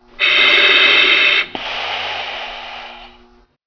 respirator

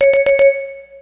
aol inactive, computers

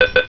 incoming, computers

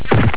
fall, fighting

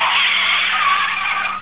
car screech stop

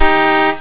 car horn short

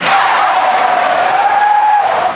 car skids

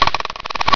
banner open, computers

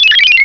computer beep; computers

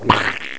goopy

